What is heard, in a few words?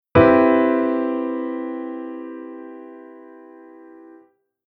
Chord Maj7